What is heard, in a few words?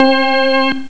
full out organ; ST-XX Amiga Soundtracker Sample Packs